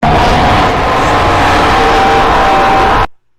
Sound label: jumpscare sound; fnanormans 1 original